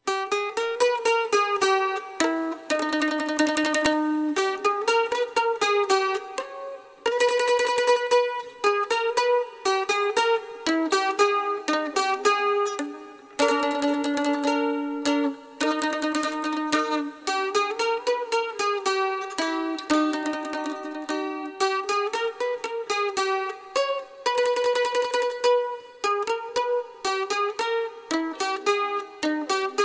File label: Music Mandolin